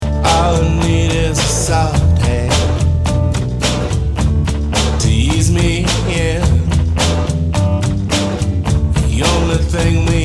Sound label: soft hand sample